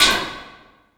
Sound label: Oneshot